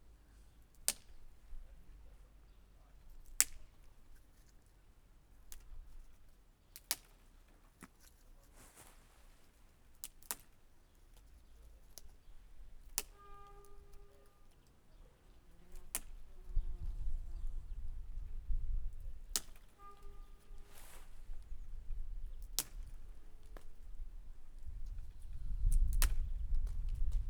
apró faágak letörése; Varbóc

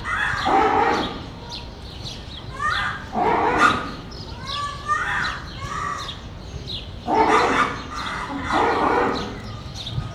kivulrol ahogy a majomhazban van, vari; debreceni zoo 2012

